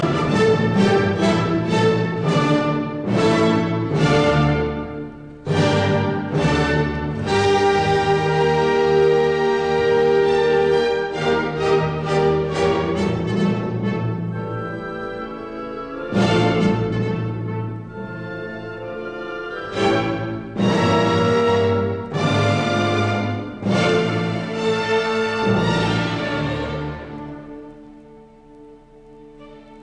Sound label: Symphony in D minor Choral Allegro ma non troppo un poco maestoso; Symphony No 9; Op 125; Bruno Walter